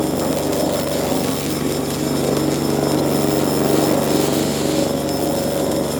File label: Moving Platform; Ambience; Warframe; Clan Dojo